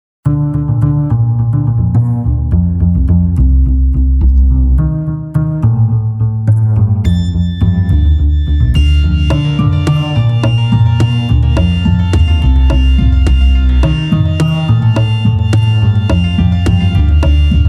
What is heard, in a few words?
Polka